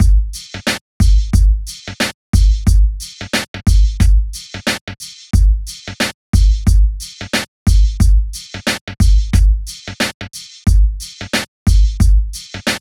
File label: Loop